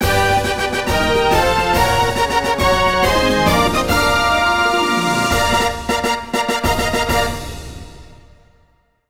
Fanfare Zone Clear; PokePark Pikachu's Adventure; Wii

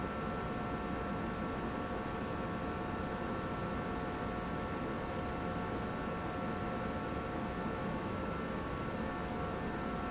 Computer Tower Noise

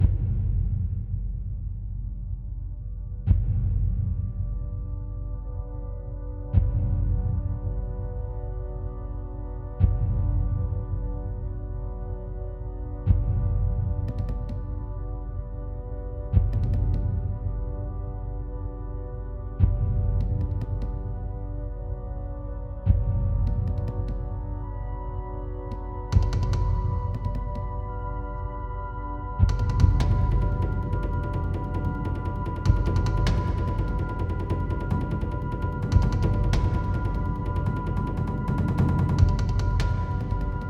Drone Tut Loop Main Stem; Sniper Ghost Warrior 3 2017

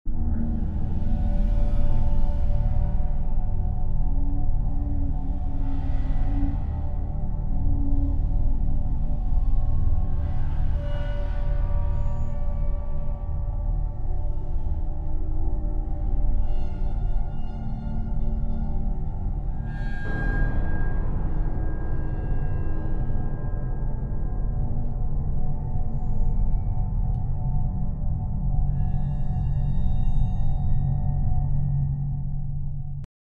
Ambient Mission

